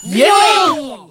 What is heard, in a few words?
cheer; bfdia; freesmart